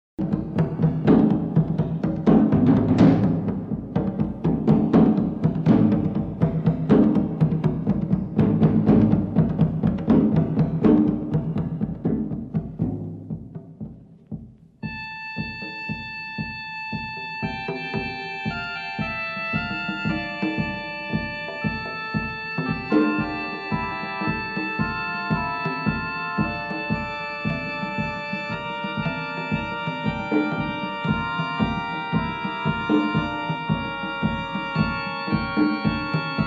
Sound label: Drums Corale; Drums Choral; Kronos Records; library music